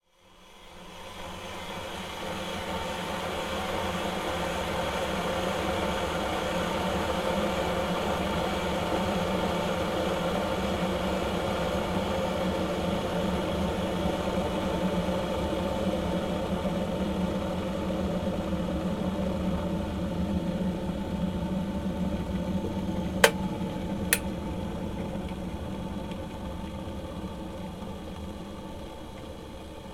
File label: household kettle boil short already hot